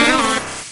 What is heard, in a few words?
pokemon oddish cry